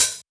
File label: Cymbals Open HH; Vengeance Samples